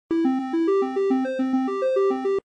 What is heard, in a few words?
REELSET START; Red Hot 40